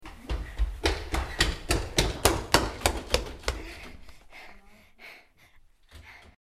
rennen im flur

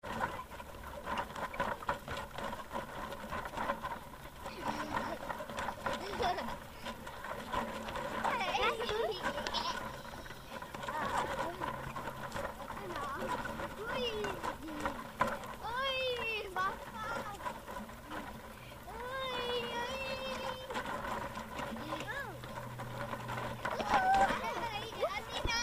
ruetteln am klettergeruest

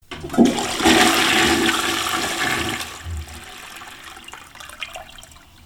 klospuelung

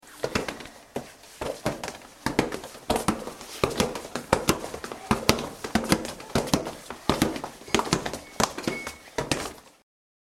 ball wird gegen eine wand gekickt

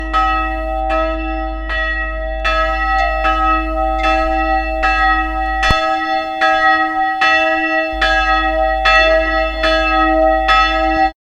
Kirchturmglocke

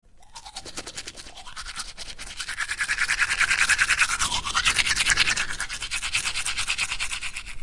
zaehne putzen

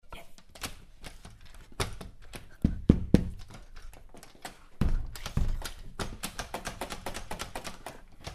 kinder spielen kicker

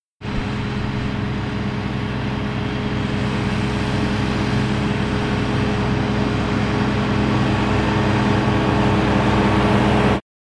Traktor

